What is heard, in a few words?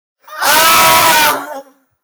moaning boys